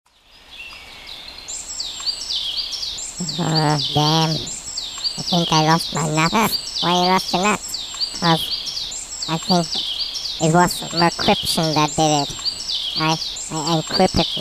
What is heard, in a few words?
squirrel